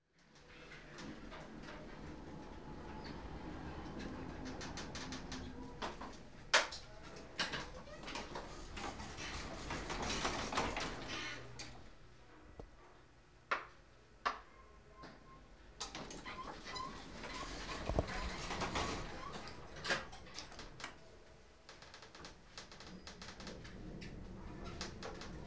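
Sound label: elevator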